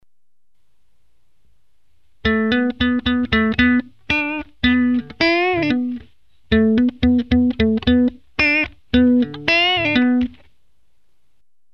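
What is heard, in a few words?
electric guitar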